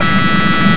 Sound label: buzz; hawaii